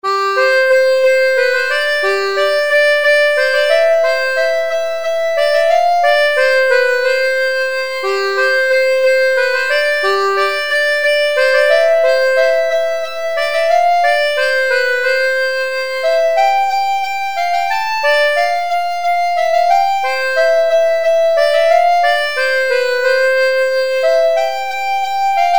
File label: melodie